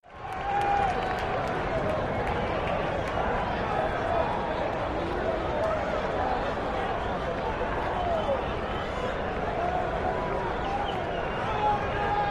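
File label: Arena Crowd Yell Cheer; sports arena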